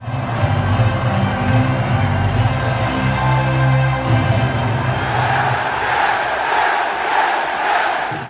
Yes Chant; Islanders

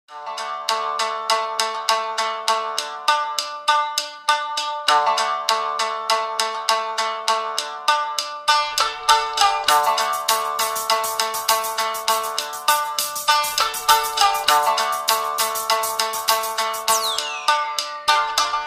Koto

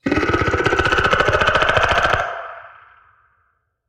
Burrow Noise; Reksai

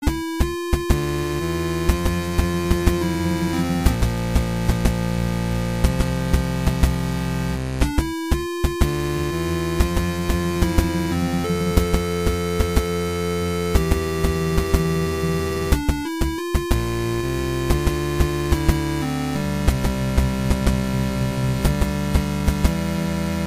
8 bit; Terminator

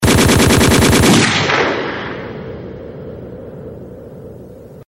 Fully Auto AK47

